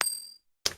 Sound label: coin